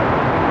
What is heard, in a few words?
SAND; RealCarSim